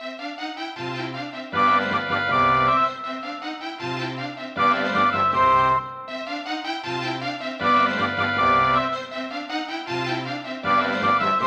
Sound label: LG MIDI ART GS1000R; classical; Mozart; Concerto K467 partial